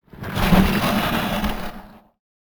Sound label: Deepcoiler Death